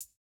hihat, house

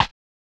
Modern rimshot, Electro

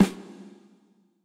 hall resonance snare

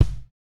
kick, house

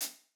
pop hihat

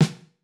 snare, lofi hiphop; Legendary lofi hiphop

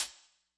hihat; Pop; pasific

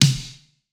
pop snare

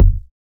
Hiphop kick; Reverb+EQ+Comp+Limit

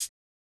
Modern hihat, Electro

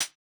hihat, house

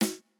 Jrock snare

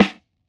hiphop snare, Effect on

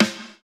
snare, snare house

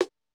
Korg snare

